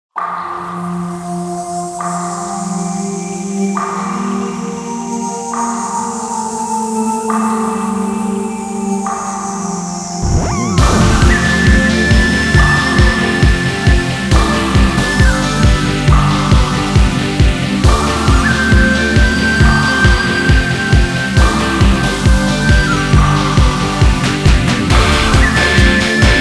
Music Bear

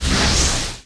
ghost magic; madoghost

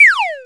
MOVE MONSTER; Eft; Gacha

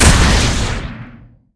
Weapon Axe Hit

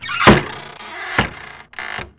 BackGround Goblin; Eft

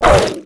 godhand hit; Eft

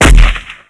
cannon attack

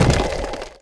UGLY PILLAR die; Eft

